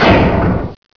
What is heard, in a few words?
lights